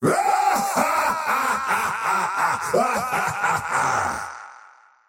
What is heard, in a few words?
laugh; dota; faceless void